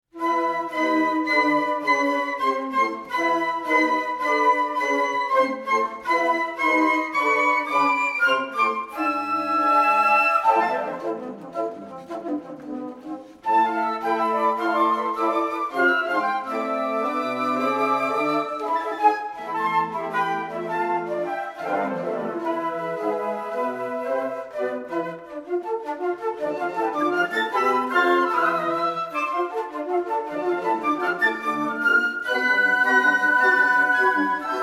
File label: flute frenzy